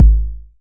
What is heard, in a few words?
909 BD; Goldbaby Tape808 & Tape909; The Tape 909